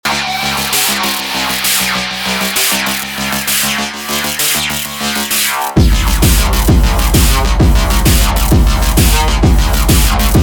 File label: pendulum snippet ohne kick, industrial techno